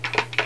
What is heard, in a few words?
flashlight bounce; SysError